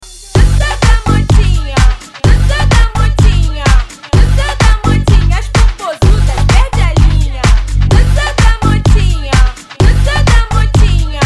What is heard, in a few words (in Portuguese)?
sound car carnaval